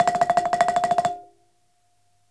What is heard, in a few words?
Balafon; E-MU Producer Series Vol. 7 – Old World Instruments